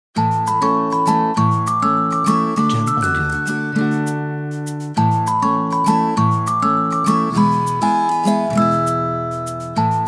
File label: Kids Happy Background